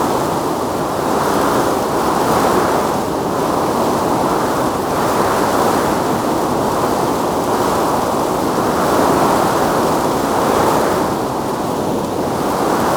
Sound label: helicopter rotor wash; Rust